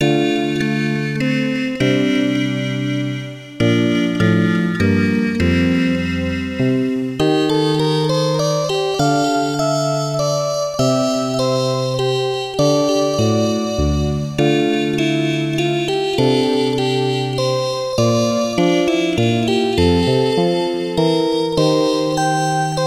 Orgel of Sadness; Lagrange Point

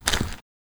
walk gravel

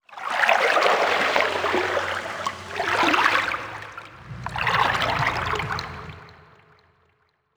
environment water calm distant

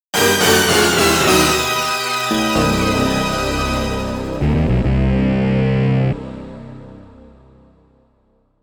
Judging- Fail; Idol Death Game TV Dream Songs

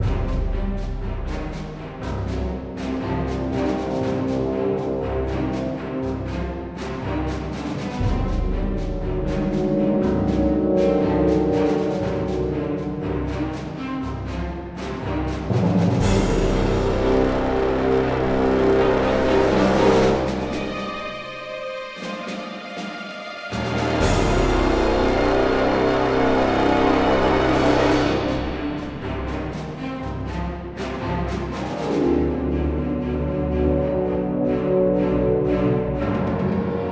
Starfighter Music; Galatic Starfighter

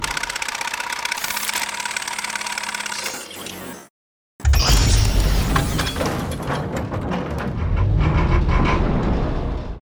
exit door open

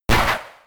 Slam

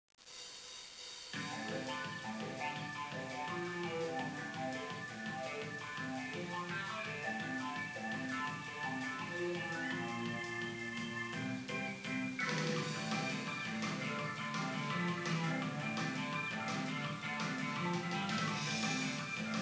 bgm karaoke; usen; yakuza ryu ga gotoku kiwami 2 pc gamerip

